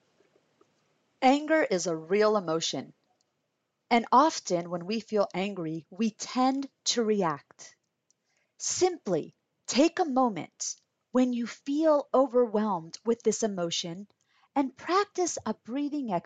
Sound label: Breathing